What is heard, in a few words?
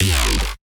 wobble